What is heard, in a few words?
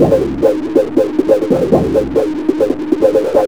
LOOP FX, Big Beat Drumloops